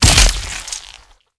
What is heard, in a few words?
body head; dismemberment